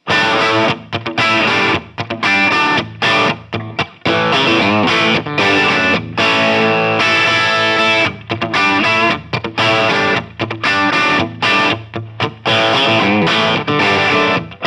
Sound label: Friedman Light Crunch